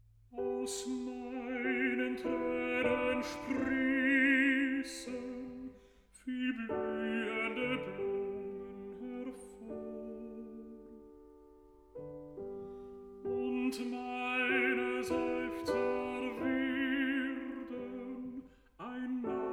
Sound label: song cycle for voice & piano; Dichterliebe; Schumann